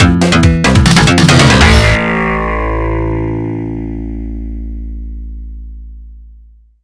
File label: music challenge lose; Duck Game